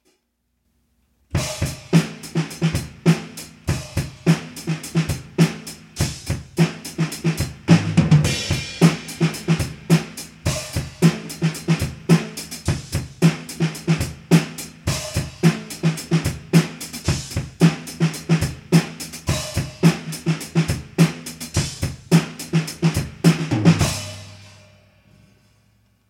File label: deth drums